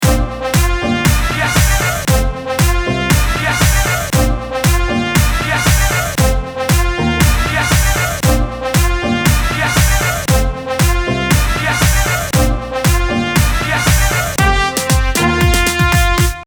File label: Ringtone